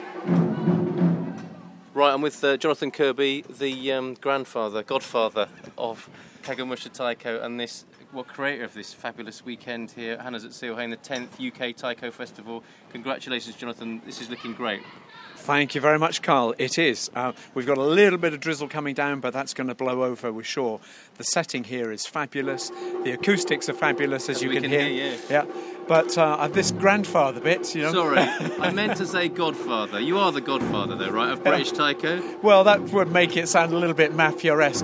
the calm before the taiko thunder

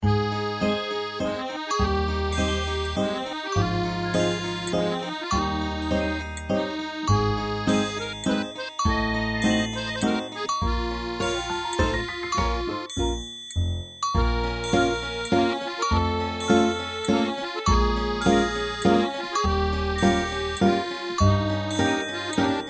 3/4 waltz

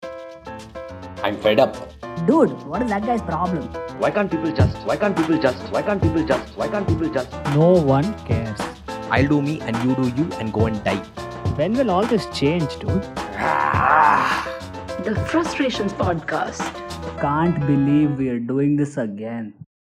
jingle; the frustrations podcast

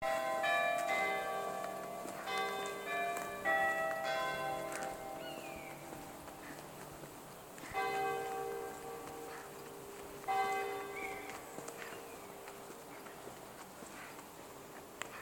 lower peover church 2pm

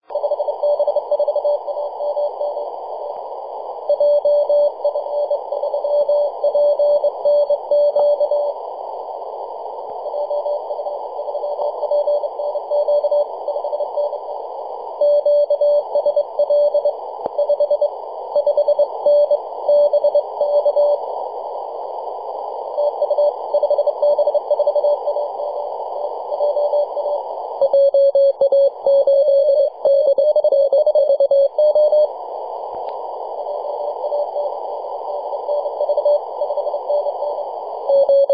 3.505 MHz CW

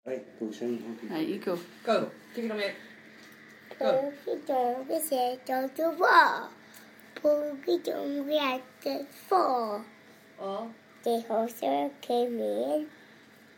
my 2year old nephew singing humpty dumpty

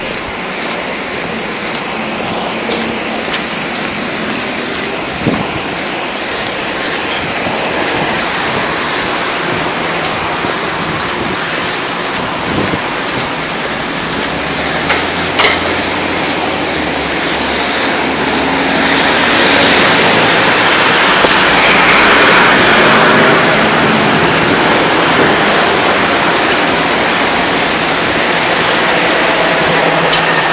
nottingham soundscape

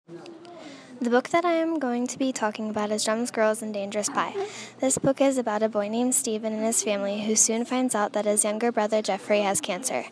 drums, girls

